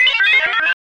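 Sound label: sphere blips large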